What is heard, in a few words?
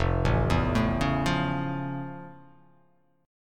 F Augmented Major 7th, chord; F+M7 arpeggiated; 1,0,2,2,1,0,x,x; JGuitar